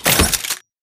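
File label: Tick Movement Footstep Jump; Titanfall 2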